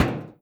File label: impact, metal barrel; physics; props